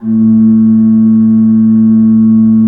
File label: A1, Cathedral Organ